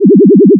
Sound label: freq Mod